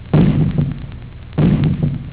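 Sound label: explosion; GUNSBMBS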